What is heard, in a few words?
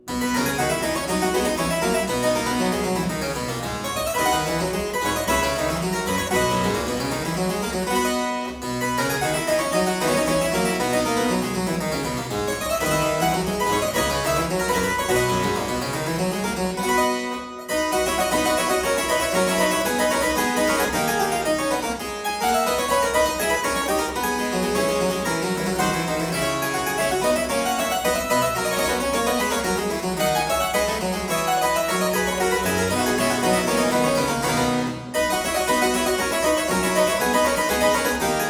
Aria in C major - Variatio, harpsichord; Buxtehude; Complete Harpsichord Music; Aria 'More Palatino'; BuxWV247; Variatio 3